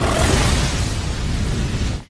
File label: Heavy Wind Push